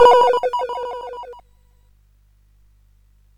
SFX; Narco Police

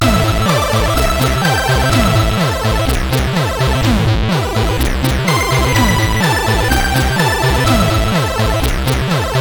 X-Music Remix, CSG8580

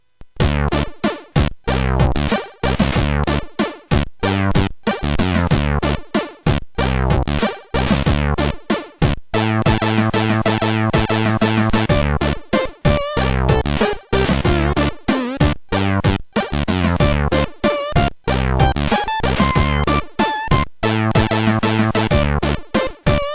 Metal, CSG8580; CSG8580R5; sid

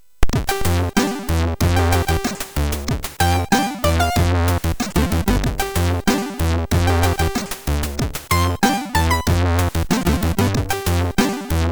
Instruments, sid MOS6581R3

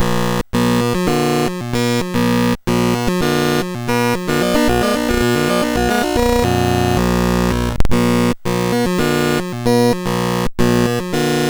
BASIC sid MOS6581R3; Interceptor Base

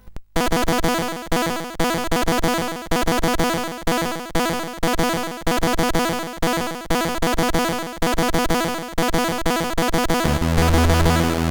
In-Game, sid CSG8580R5; Breathless

